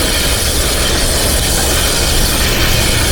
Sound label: grind metal wire